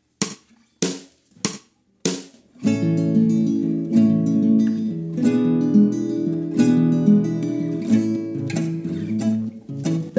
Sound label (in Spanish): Cuatro guitarras